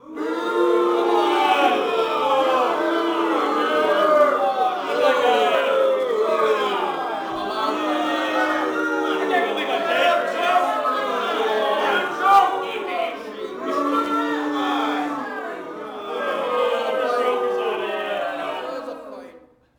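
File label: boxing crowd small boo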